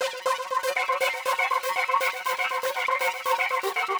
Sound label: Kaossilator processed (120BPM); musicradar